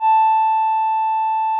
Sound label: VP-330, String Machines; Best Service; XX Large Most Wanted 2